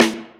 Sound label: snare 1; virtual drum sounds